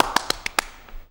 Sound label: MULTCLAP, MULTICLAPS; Best Service; World Colours